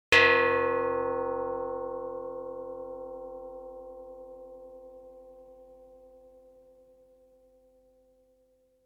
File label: Small soft gong hit